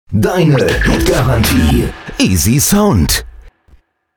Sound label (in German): jingle; easysound